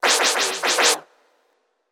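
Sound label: DJ Record Scratch Effect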